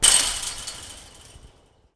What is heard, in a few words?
link hit; langeles